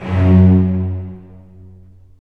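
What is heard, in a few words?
STR, strings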